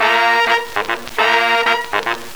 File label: Old Scratchy Sect, Effected Horn Section; Sonic Foundry (Sony Creative Software); Jazz Solos and Sections